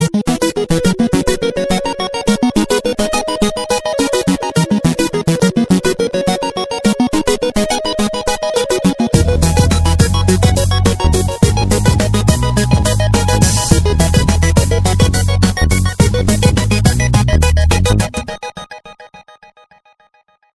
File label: Ringtone